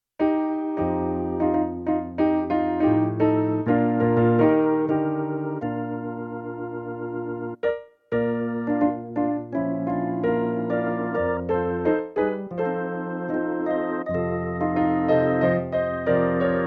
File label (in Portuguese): playback hino